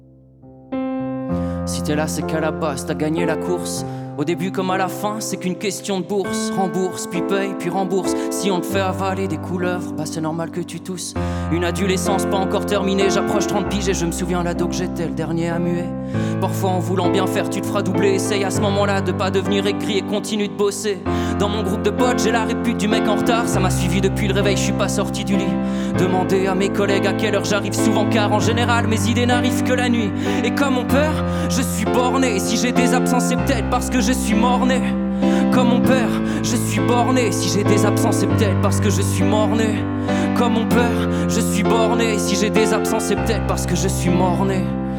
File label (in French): Piano Extrait